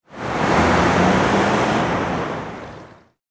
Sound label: traffic; zones